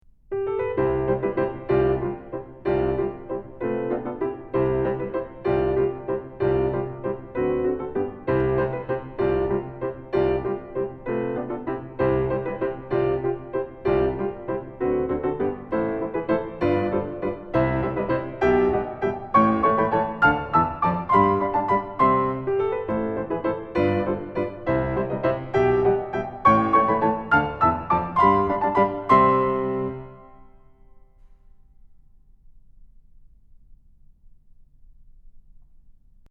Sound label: German Dance in C Major; Magrath Book 08